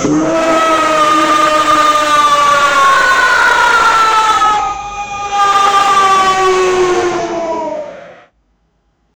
a hellish demonic scream; fleshy

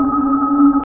faint ghostly wail of a; subdued

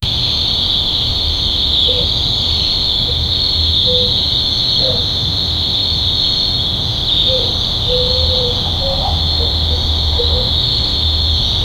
birds singing in the night

